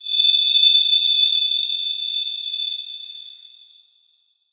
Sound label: cell Damage; CARRIER